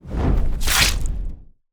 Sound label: monster baf